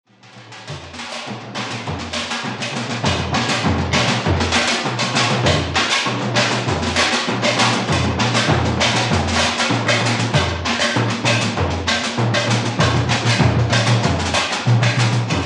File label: batucada